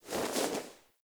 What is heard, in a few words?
Textile Pickup B